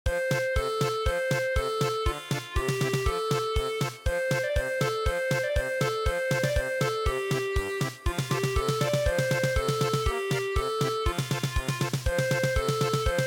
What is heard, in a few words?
German Polka; traditional